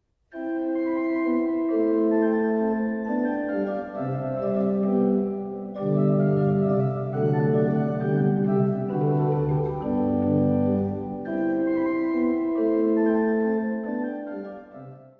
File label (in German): Menuet Trio Menuet Suite C Dur; Gottlieb Muffat